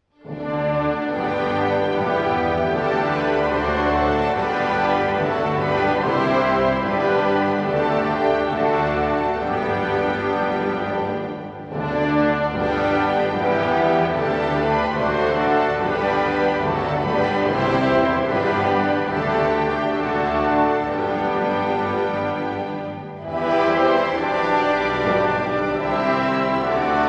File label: Dutch anthem